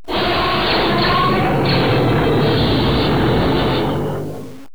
takeoff; Star Wars; esb slave1

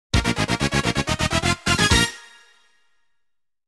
Station Call; Sounders, Bumpers, CUES & IDENTS; DennisMusicLibrary

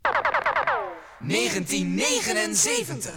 jinglepackage; topformat LP jinglepackage 1

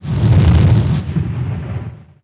whoosh

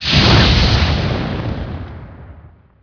lake ignite

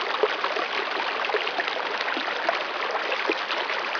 brook loop

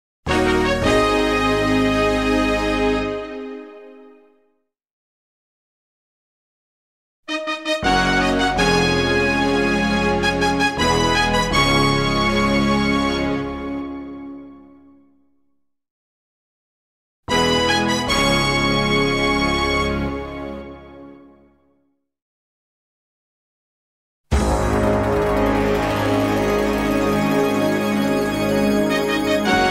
Data Fanfare; Peners Bumpers Commercials; DennisMusicLibrary

